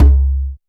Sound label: CONG TOM, CONGA BONGO; Techno 138 BPM; Best Service ProSamples vol.54; AKAI